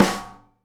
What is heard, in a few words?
SNARE, Live Snares